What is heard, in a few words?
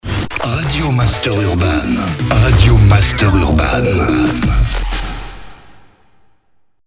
JINGLE RADIO; RADIO MASTER URBAN; Habillage